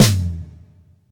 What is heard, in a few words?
drum hit finish; osu!